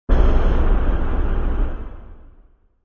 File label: Whoosh; Whooshing sound for video editing, movements, logos, flash, or animation; Sound Design